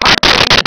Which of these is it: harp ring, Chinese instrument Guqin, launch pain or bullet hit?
harp ring